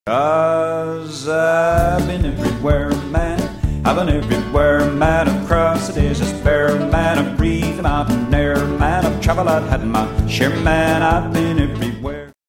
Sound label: Music In Style